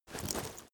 leather metal walk fast single

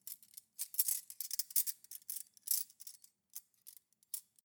cutlery cake forks handle long

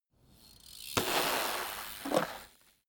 bmx maneuver ramp land

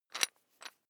electric drill bit fasten wrench turn very fast

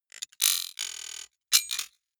sci-fi electromechanics small stutter metallic

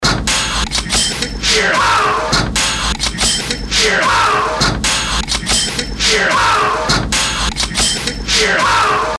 danger fx loop

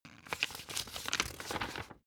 old flip page long

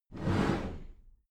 cupboard slide door slide heavy

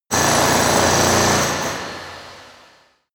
construction site stone drill single

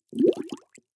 bubbles medium

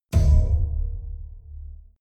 door open signal spring

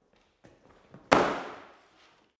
impact hard indoors

